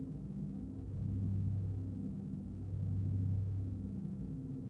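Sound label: room small ambience; Star Wars Obi Wan; XBOX; Theed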